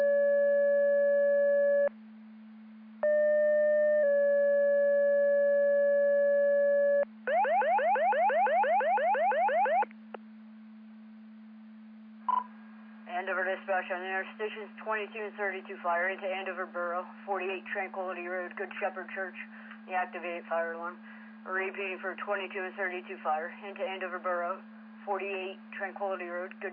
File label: Siren; Andover Twp Boro